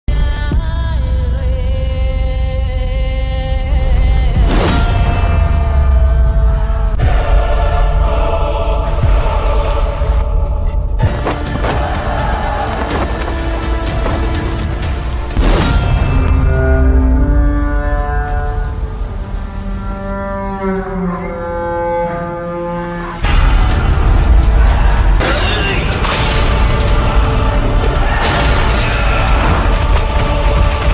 trailer bgm ringtone; yaathisai

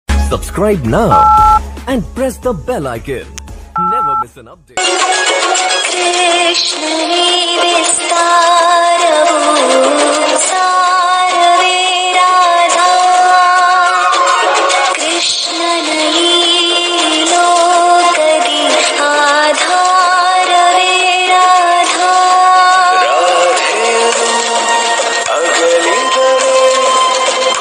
flute ringtone instrumental ringtone

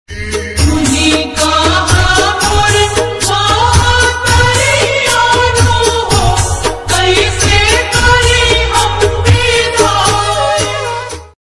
navratri song; maa durga; sherawali; maa durga bhakti bhajan; durgapuj

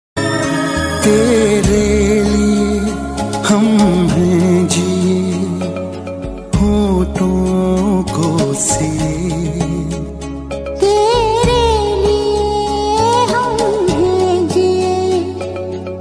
movie song

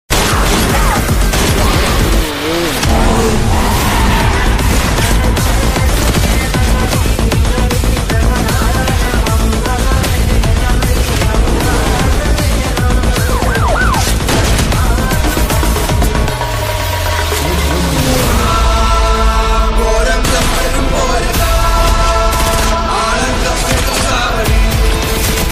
malayalam movie bgm

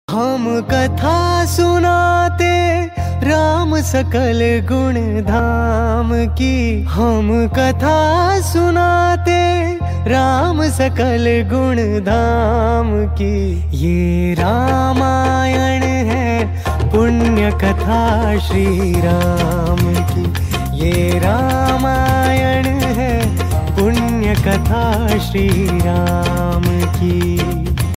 ringtone ramayan ringtone ram ringtone

